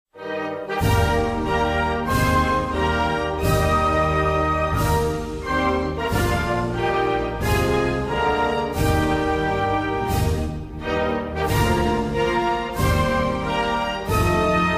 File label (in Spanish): Himno Nacional de Surinam; Bandera e Himno Nacional de Surinam; Flag and National Anthem of Suriname